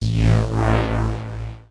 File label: KEY SHOT TEKNO 140 A; Techno2; Singleshots